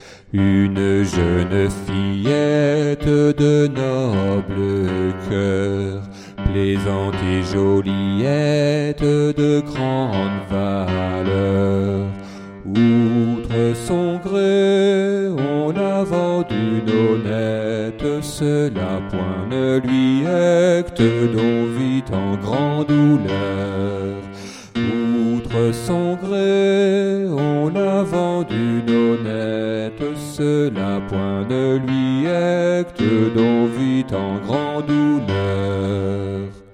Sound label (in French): basse; Une jeune fillette; Anonyme